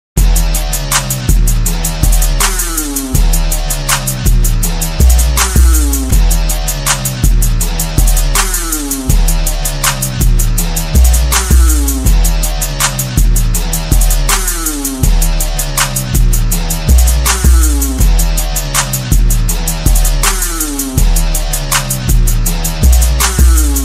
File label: bike ringtone; bangalore days